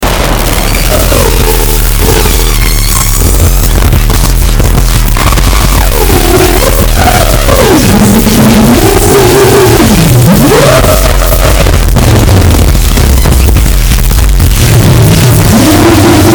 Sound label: drrrty noize elektro; lolicore